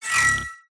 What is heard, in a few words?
Spawns Portals Teleports; PMSFX